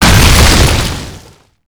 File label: hit common ground hit